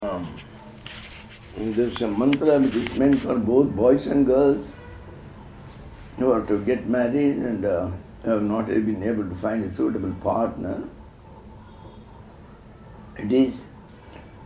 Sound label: RUKMINI VALLABHA MANTRA